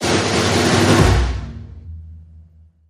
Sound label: Vaudeville Drum Set